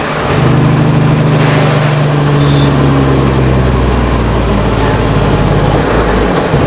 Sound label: throttle off, vehicles; tdmcars